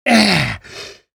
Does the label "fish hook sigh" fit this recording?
Yes